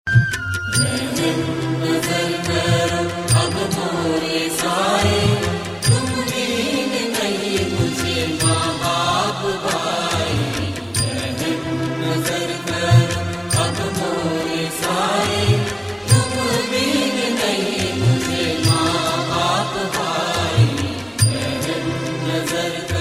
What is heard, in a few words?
STUTI RINGTONE; SAI BABA